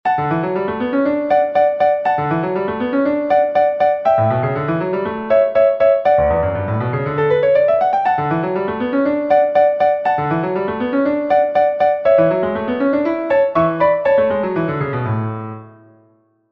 LH Romp - D; Czerny

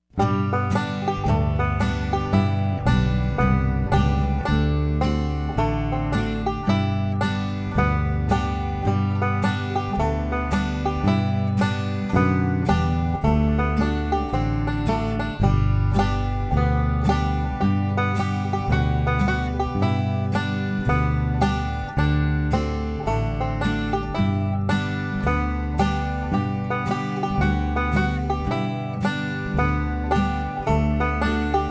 5 String Banjo Natural Style

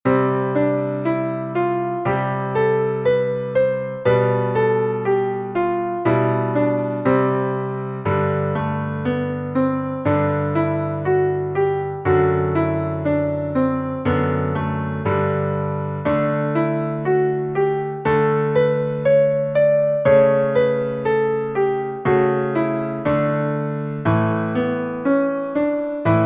Major Scales Standard Patterns

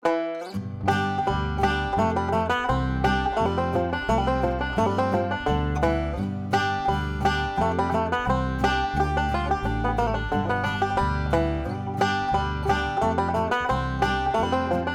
Salt Creek, 5 String Banjo Natural Style

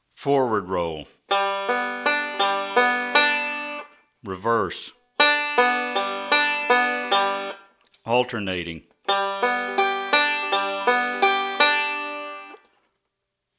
Four-Note Roll With Single Note

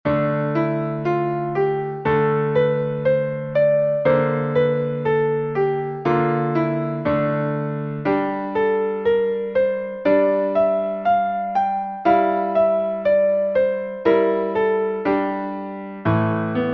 Minor Scales Dorian; Minor Scales 6a Dorian

